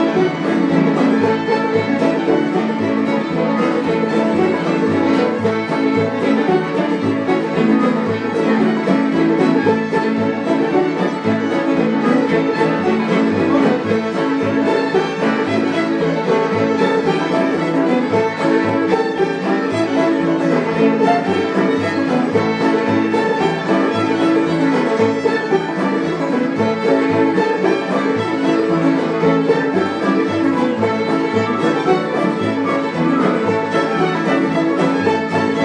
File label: old time music jam